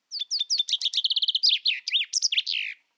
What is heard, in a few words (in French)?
pinson strieu, pinson des arbres; Gaston Gast